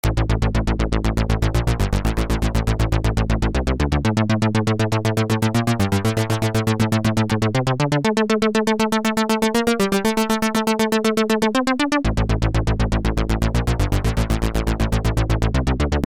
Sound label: synth